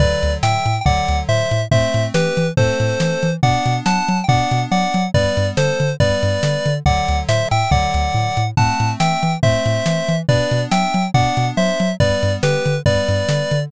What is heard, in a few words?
bgm street